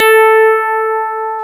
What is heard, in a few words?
FM Rhodes; Club-50 - Foundations Roland